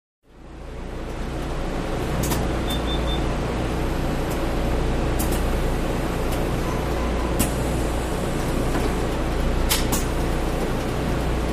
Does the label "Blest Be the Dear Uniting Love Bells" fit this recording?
No